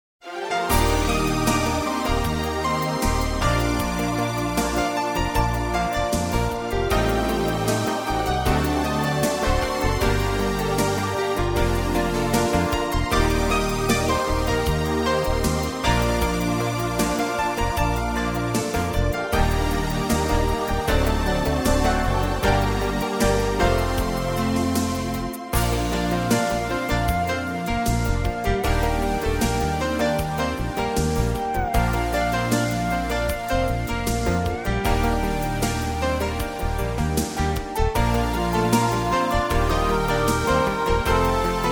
Easy Listening & Classical; Sound Ideas

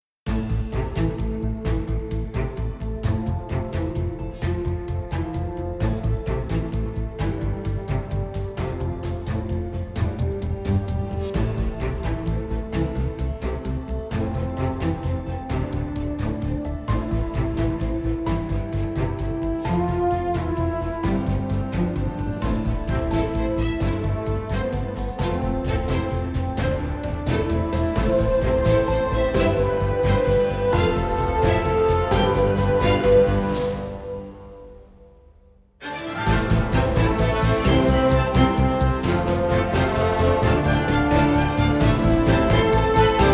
Looming Victory, NEWS BEDS

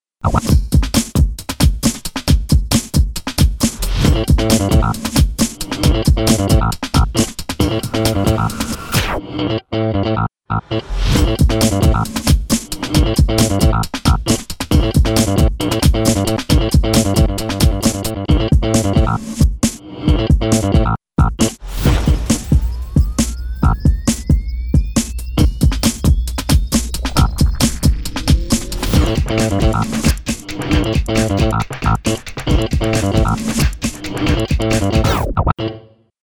bass n beat, bed

